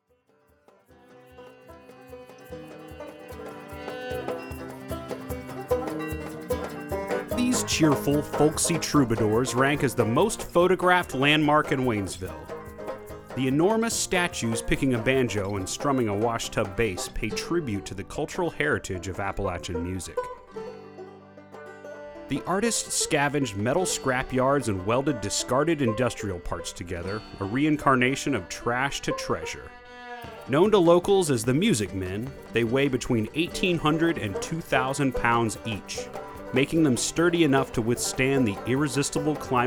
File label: OLD TIME MUSIC